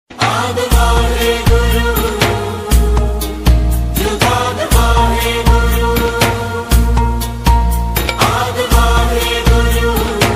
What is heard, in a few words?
waheguru simran satnam shri waheguru ringtone kirtan; guru nanak